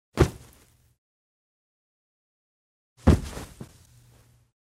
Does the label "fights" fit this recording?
Yes